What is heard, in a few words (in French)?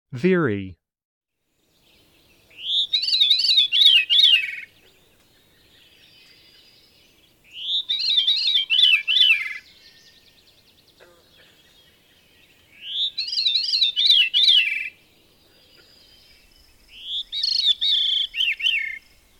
Grive fauve